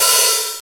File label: CYBER KIT, DRUMS